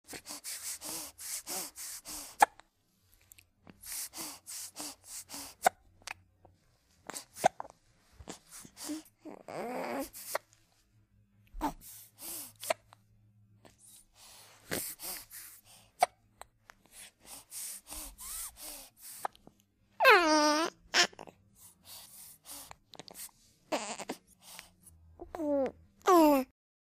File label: Baby Breathing Heavy